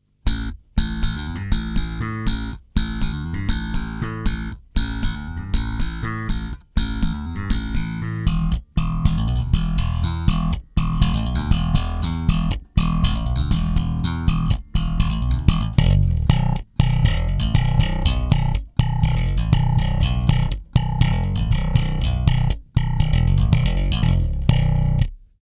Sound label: low high midcut; Bugera